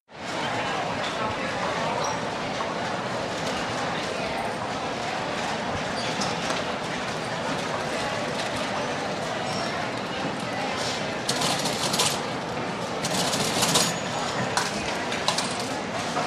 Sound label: CROWD -STORES AND HALLS DEPT STORE: ground floor with phones and cash registers, recorded from balcony.; Macy`s